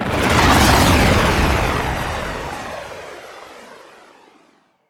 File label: Extraction Arrival; Blue Sky Liset; Warframe; Vehicles; Liset Flight